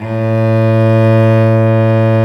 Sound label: STR Vc Arco p